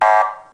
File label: horn, Sirene; deathmatch; [BVR]Sirene; POLICIAL